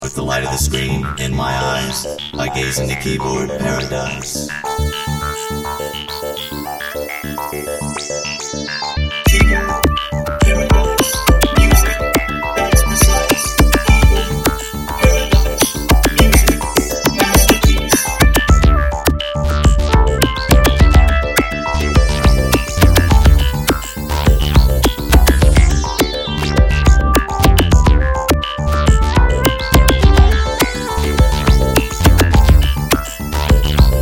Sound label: keyboard